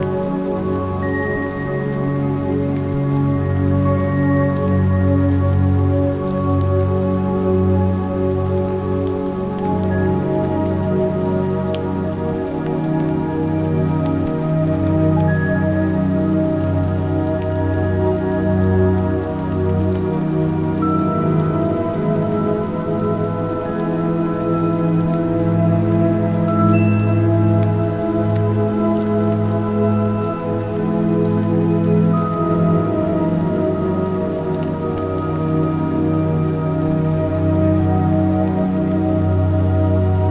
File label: Zen spa rain relaxation